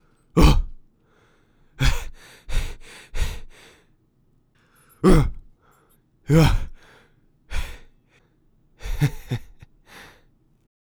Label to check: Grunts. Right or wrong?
Right